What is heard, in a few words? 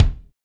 BD, BD REAL